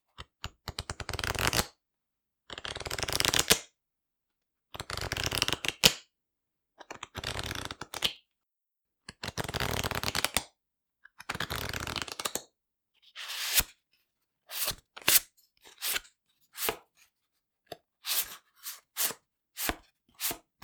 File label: Playing Cards Shuffling Deck Deal